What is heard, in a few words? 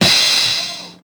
low treble open hat sound b key, Royality free open high-hat sample tuned to the B note